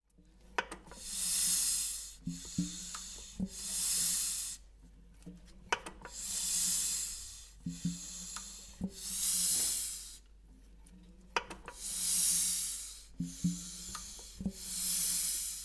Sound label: Motor Pump; Ingeos